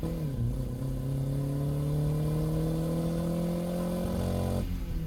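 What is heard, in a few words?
engine high loop; Blind Drive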